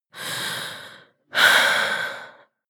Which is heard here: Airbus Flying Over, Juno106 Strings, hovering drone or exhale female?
exhale female